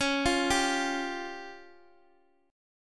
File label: C sharp Major Flat 5th Guitar Open D; x,x,x,7,8,5